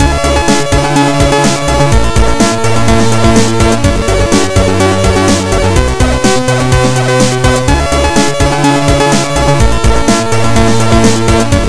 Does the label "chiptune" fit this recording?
Yes